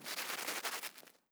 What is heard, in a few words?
Grub Burrow